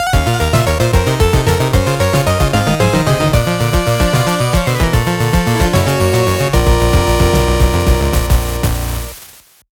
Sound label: All Clear SFX; Bloodstained: Ritual of the Night